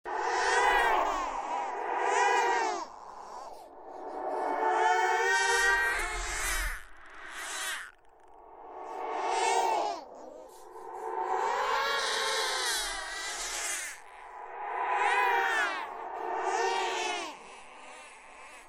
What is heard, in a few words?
scary demon ghost baby crying horror sounds